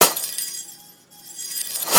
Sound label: Lightbulb Break Reverse